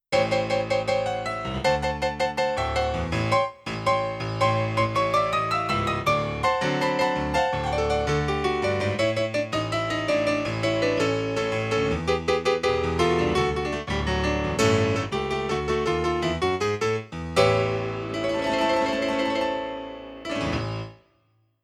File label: rock piano